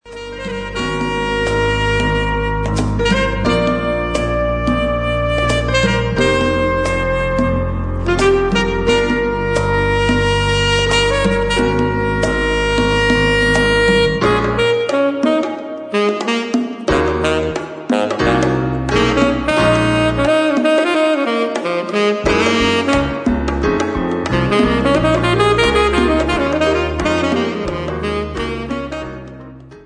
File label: funky siciliana